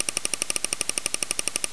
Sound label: Clicks; Grand Central Pro